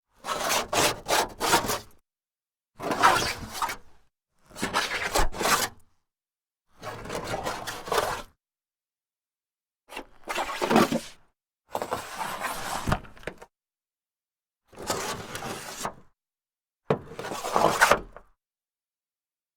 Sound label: scraping car with rock series